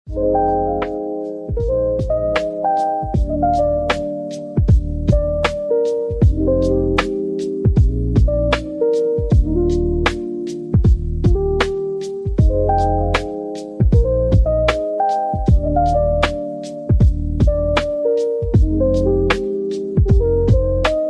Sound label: Lofi Beat, Thunder